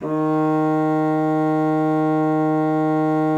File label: D#3, bassoon; Xsample VOL-6